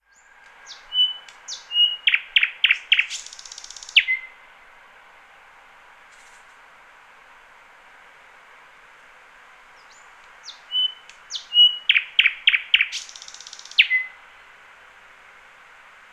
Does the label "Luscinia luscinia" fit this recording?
Yes